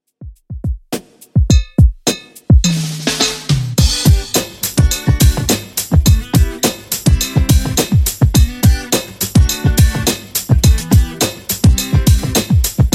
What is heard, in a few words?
90s Redrum 105bpm